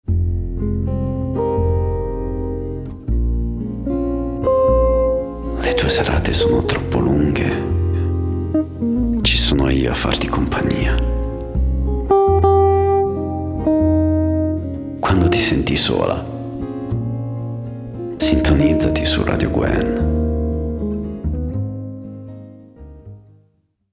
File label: Jingle; ti senti sola